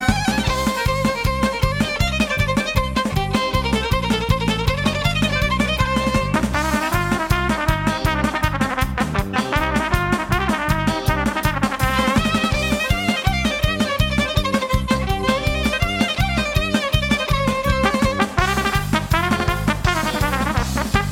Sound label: Jewish violin